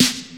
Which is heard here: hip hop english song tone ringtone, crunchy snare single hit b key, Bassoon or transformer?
crunchy snare single hit b key